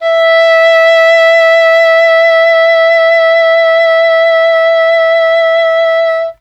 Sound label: English Horn E4; Standard Instr